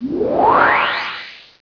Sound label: BUTTON; CD Action; mtg; DuelSounds